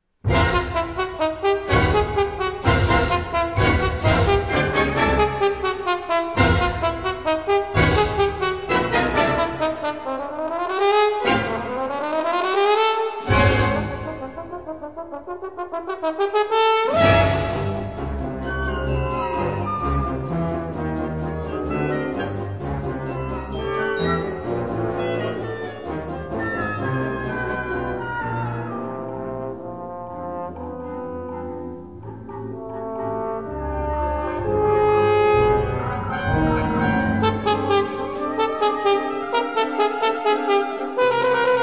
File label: Gjallarhorn